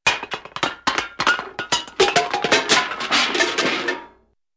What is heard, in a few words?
falling cans